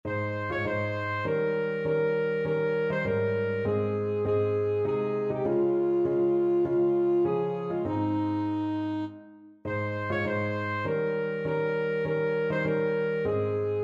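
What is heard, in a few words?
alto saxophone; i am thine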